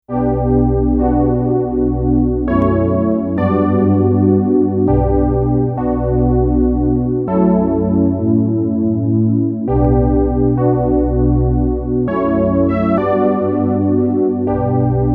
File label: Warm Pad; Lightwave; dinopark